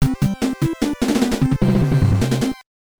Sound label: Quarter Over; Tecmo Super Bowl